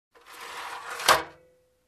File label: Closing iron drawer